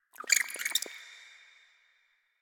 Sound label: Rat Pet Squeak